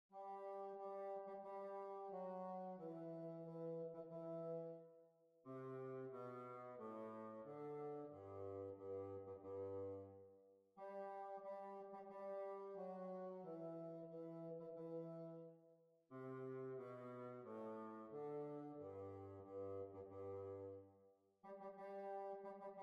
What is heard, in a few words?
Swedish Masquerade